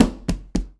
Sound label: Door Knock with Open Hand; Doors & Drawers; 1001 Sound Effects